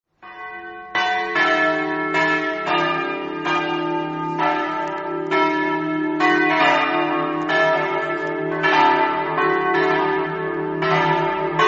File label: campane; villavicentina